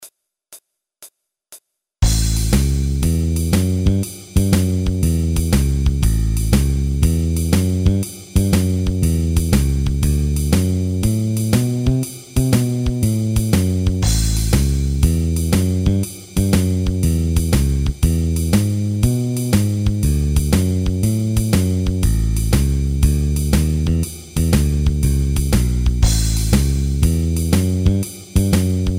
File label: Blues A 120BPM